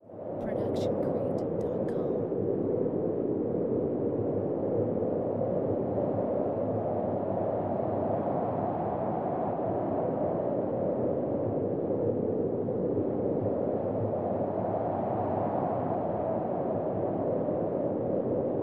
Windy Weather; soundscrate

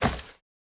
Duffle Bag Drop; SoundsCrate

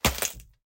Action Hit; SoundsCrate

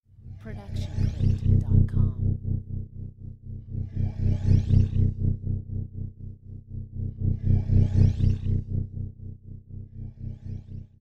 SciFi Machinery; SoundsCrate

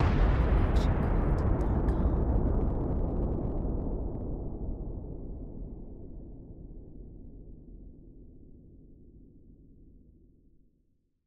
Deep and Far Explosion; SoundsCrate

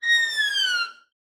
Violin Seagull Effect Fast

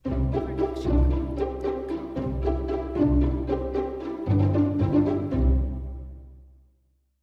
Friendly Ident; SoundsCrate

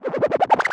Wobbly Motion Design Riser

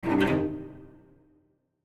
dramatic cello short; SoundsCrate

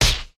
slap hit

